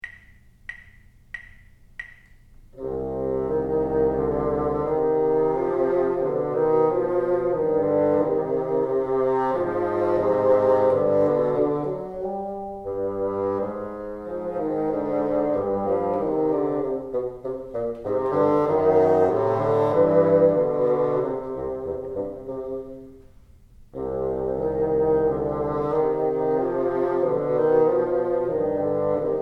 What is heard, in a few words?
together, bassoon